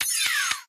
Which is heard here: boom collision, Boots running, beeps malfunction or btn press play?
btn press play